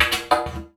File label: Didgeridoo Kit Samples